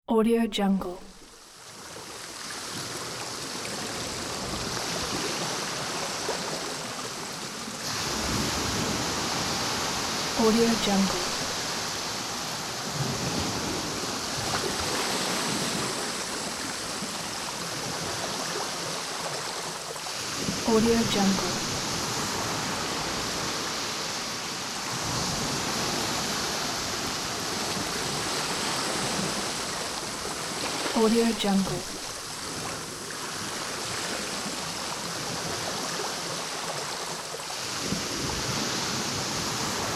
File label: morning ocean